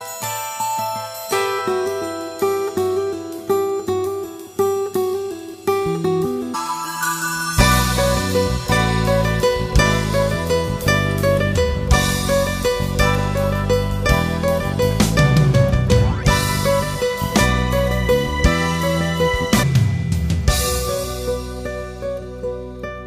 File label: Carol Of The Bells (Instrumental); Christian Christmas Music